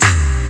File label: Synth Shots; Vengeance Samples